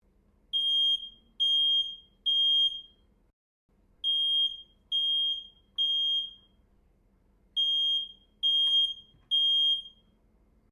Alarm Smoke Detector Operating Loop, Household smoke detector